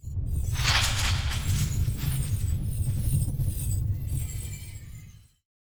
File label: In Flight Whip Crack